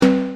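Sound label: ppg percussion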